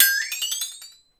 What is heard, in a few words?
Metal, Percussive FX; musicradar; cartoon caper